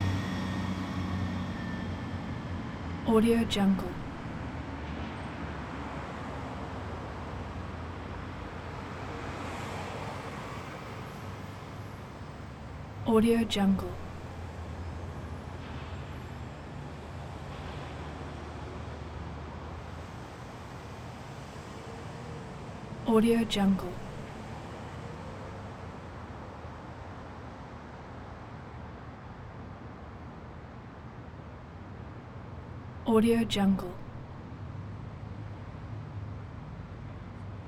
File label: traffic evening